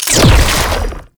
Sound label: Shot; Weapons; Warframe; Tenno; Ink Rifle